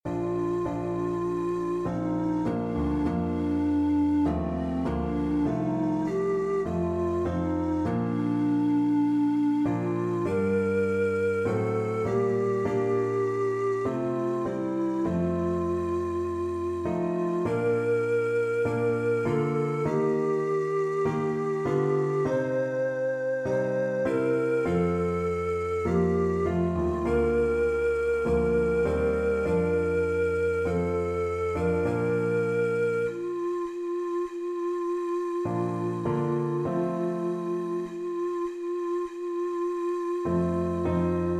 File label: ocarina six hole